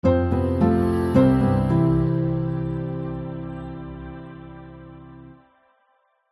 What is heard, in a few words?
multimedia musical branding intro piano choir trustworthy warm; zapsplat